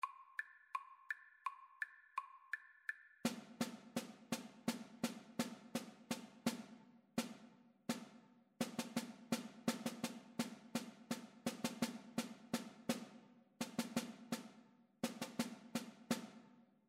rhythmic dictation; ear training

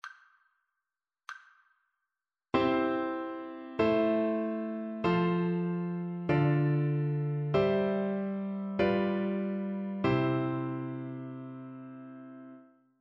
harmonic dictation

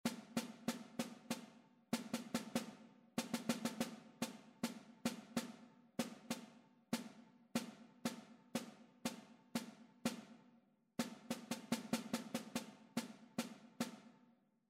rhythmic error detection; ear training

